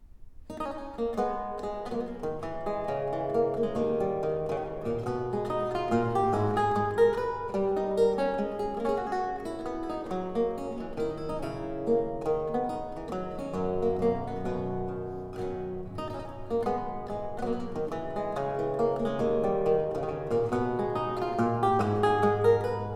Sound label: Courante, Lute Music